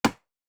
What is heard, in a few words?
Wood Chop; Survival Game Sounds - Lite Edition; Crafting